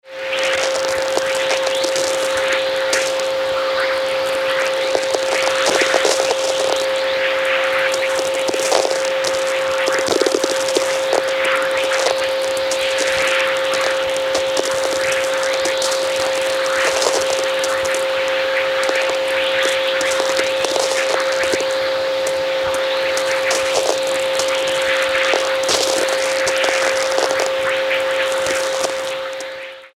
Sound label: chorus